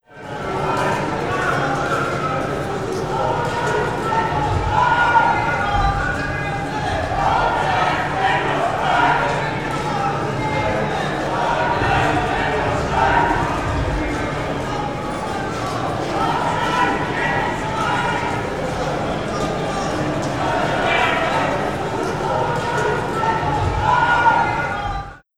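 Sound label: london Walla; Cities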